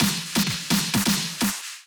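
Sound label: snare Fill, 128BPM; Drum Loop; One Shots; VEC5 Multi Loops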